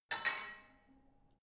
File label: barbell only lift up soft, fitnessstudio